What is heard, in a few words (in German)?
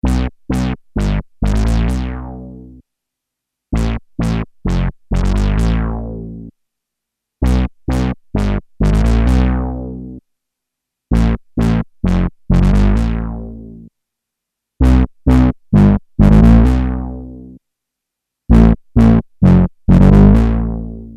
saettigung, behringer model d; Kompakter Desktop Synthesizer